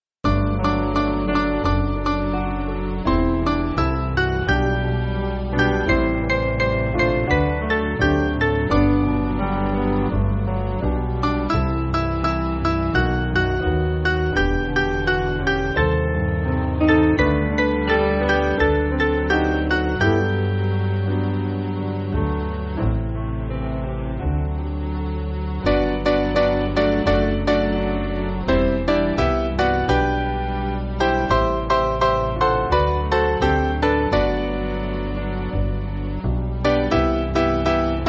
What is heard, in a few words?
Piano